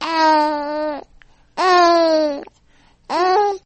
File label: Nursery Baby Awake